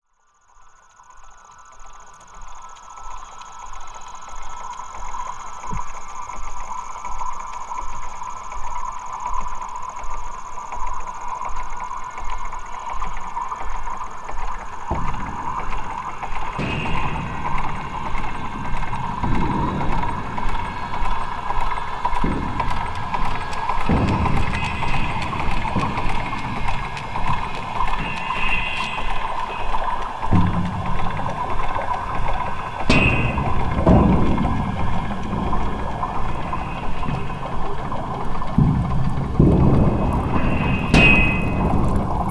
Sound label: Narmada River Sample